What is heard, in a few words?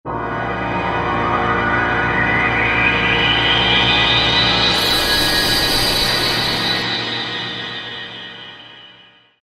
Scary Pad, Roland V-Synth; Factory Patches